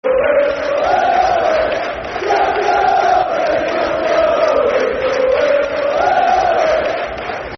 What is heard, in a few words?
Football Chant, Soccer Songs; Champione, Champione; Scunthorpe United Scfc